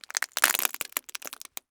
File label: crunch pasta shells; prosoundfx